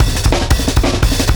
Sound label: Bon Bon Kit 1-Bar Fill, Drum n Bass; KJ Sawka - Drum n Bass & Beyond; Beats and Percussion